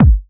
fluffy kick drum one shot b key, Royality free kick sample tuned to the B note